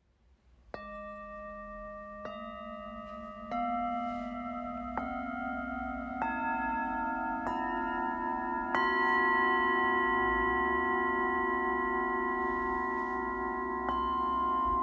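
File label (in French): Set, bol chantant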